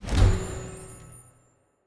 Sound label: enchant, enchantment table; Minecraft; Conquest Default